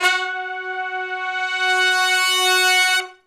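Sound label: Hi Long Note (F#) unison, horn section, R&B; Crimson Blue and Fabulous Horncraft 4 RnB; Horncraft for R&B; Sections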